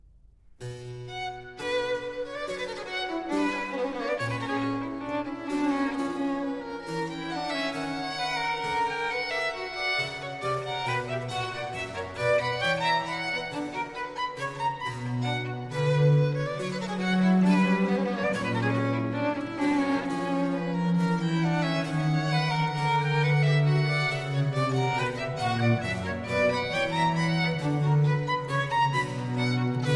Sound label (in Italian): Canon 2 A 2 Violini In Unisono; J.S. Bach; Musikalisches Opfer